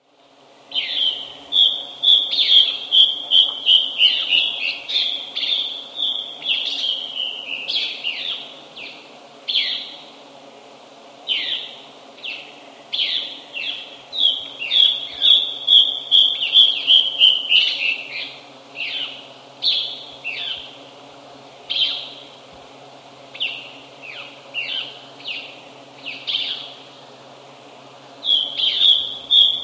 Caura Antbird, Nature's Songs, S. America

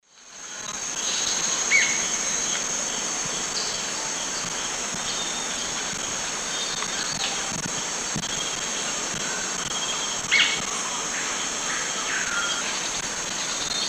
Galbula dea, call; S. America; Eastern Lowlands

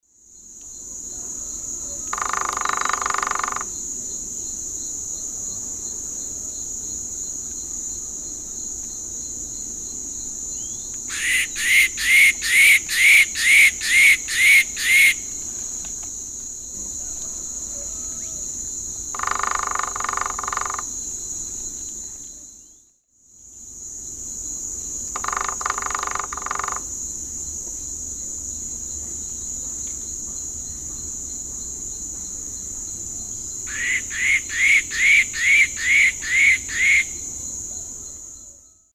Piculus leucolaemus, drum and calls; S. America; Eastern Lowlands

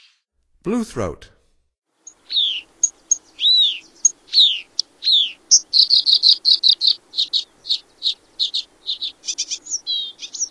Bluethroat, Songs of Birds of North America; Stokes

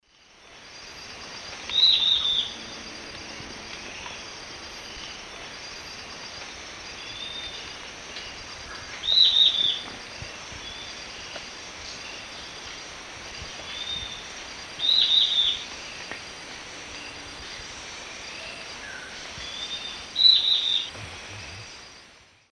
Lathrotriccus euleri, song or call; S. America; Eastern Lowlands

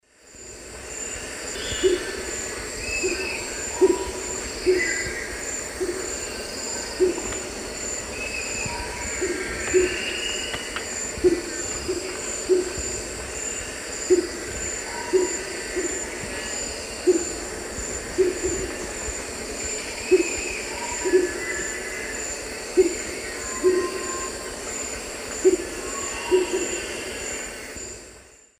Baryphthengus martii, hoot songs 2 birds; S. America; Eastern Lowlands

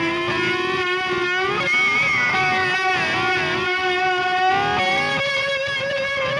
Slide Feedback Wail, Guitars; Rock

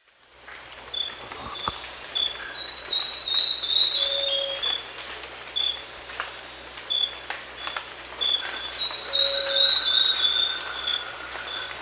Sclerurus mexicanus, song; S. America; Eastern Lowlands

